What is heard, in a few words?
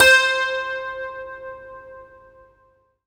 Piano